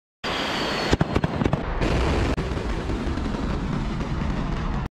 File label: Ballistic missile; موشک بالستیک